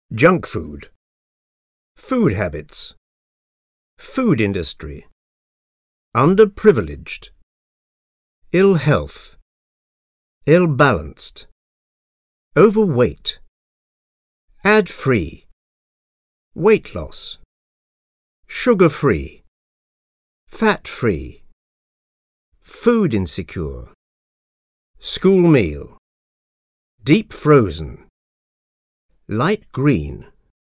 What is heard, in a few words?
techno